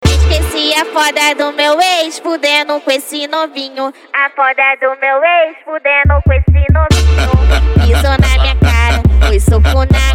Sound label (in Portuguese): funk mega bass e noia dance